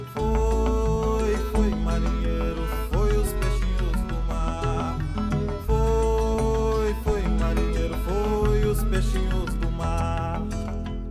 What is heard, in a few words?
ethnic rest